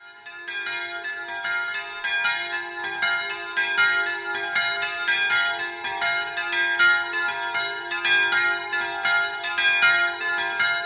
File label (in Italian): Sottomonte, campane